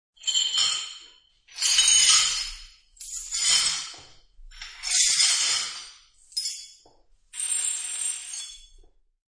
Sweeping up pieces of shattered glass and plateware.; Kitchen; Household